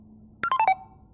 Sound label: vario disconnected alarm